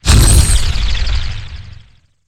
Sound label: Teleport; Evolve; Wraith